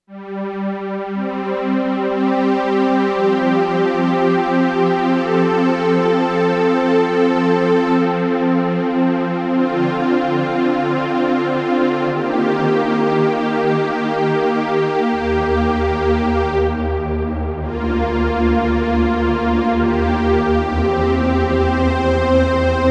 VINTAGE CHORUS STRINGS; Redshift 6

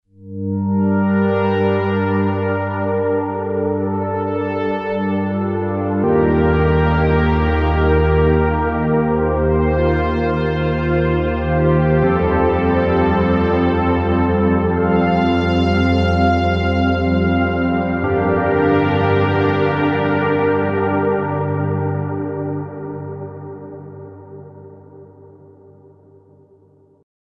ETHEREAL PAD, synthesizers; Redshift 6

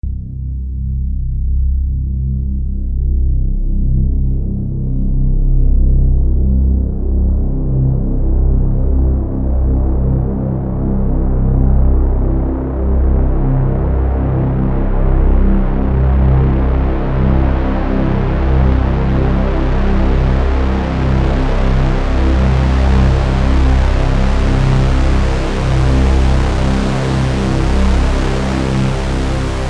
90 OSC SAW OSCILLATORS; Redshift 6

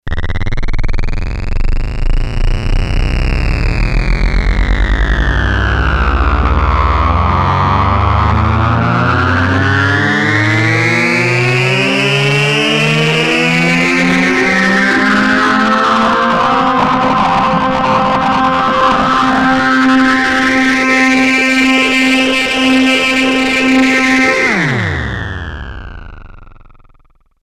Mean Distortion Riser; Redshift 6

